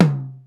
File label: TOM, DrumBase5000; Crypton; CFZ KIT 2